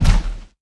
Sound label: footstep; anhur; smite; anhur skin13